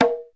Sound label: HI EDGE DRUM; 90 sSampleCDs; Crypton - DrumBase5000